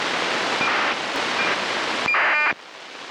ACARS, Aircraft Communications Addressing and Reporting System, digital data link; ACARS - (Aircraft Communications Addressing and Reporting System) is a digital data link s